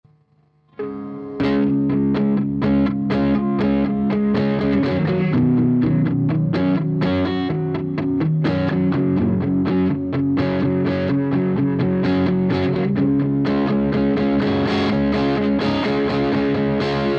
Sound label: SSL PD Quad BOSS GT1000